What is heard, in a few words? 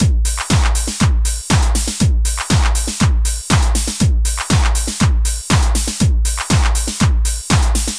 120 trance beat, Drum & Perc Loops